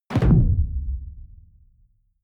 sci-fi underwater impact; hits impacts

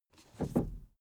suv 1st gear release slow soft; verkehr transport; autos

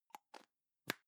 plastic can lid screw close soft; objekte; dose

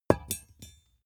small metal drop clang soft

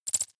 noise tiny splutter, signale; soundpool

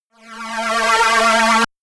shot uprising shine; pulsieren

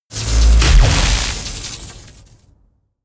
electricity; comedy cartoon

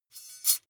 midsize metal thin clank catch

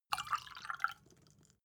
glass pour faint; soundpool

